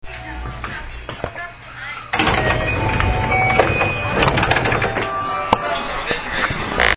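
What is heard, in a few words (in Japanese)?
ドア開け, 223系2500番台, ドア開閉時に「カラカラ・・・」となるように; 阪和線の関空快速として投入。; 番台組み換えがものすごい。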